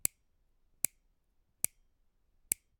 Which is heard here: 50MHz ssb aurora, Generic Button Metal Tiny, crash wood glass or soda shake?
Generic Button Metal Tiny